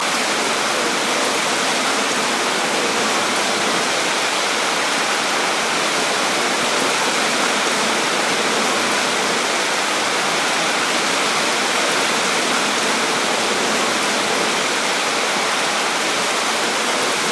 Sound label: Wind Loop